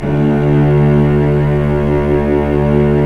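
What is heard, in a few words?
strings, mf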